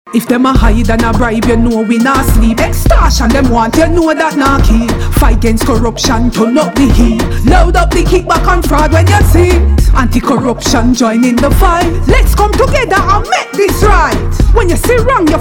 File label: radio jingle; Integrity Commission AD